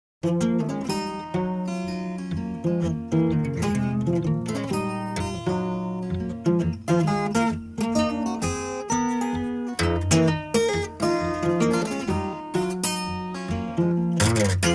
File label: Piano Tune